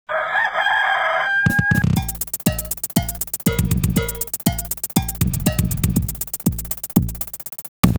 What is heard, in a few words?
Carillon